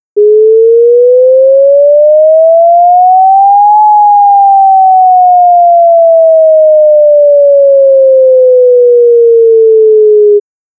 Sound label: Wail, outdoor warning; emergency; FWS-4023